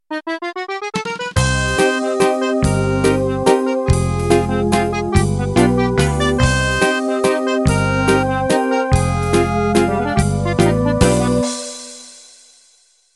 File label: Musette Intro